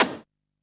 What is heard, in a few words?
Hat, Drum & Bass